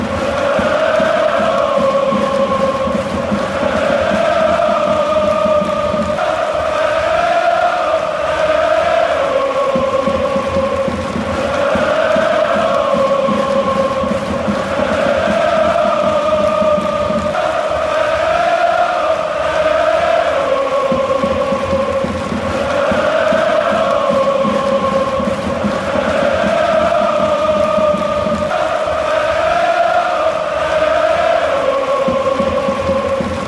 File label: allez allez ohooo, fan chants